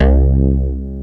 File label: ANAL BAS C2, Bass Analog & Synth; USB Soundscan vol.12; AKAI; VINTAGE